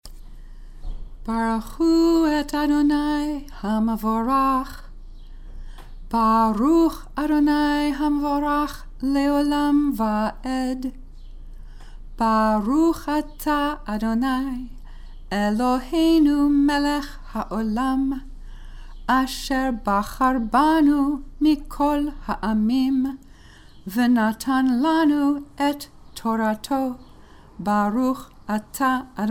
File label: Torah Blessing Before Reading Chanted